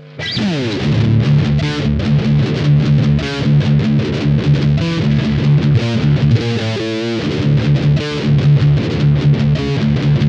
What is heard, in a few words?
higain scooped